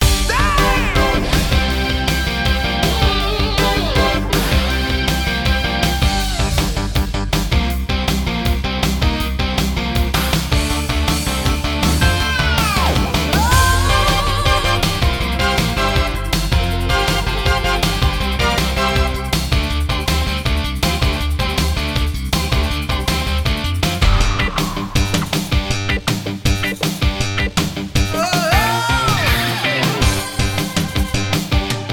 Arcade Menu; Chuck E. Cheese Party Games; Wii gamerip 2010

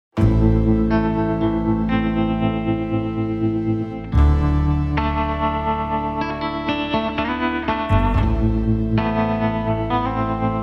Changing Rides; Desperados 3

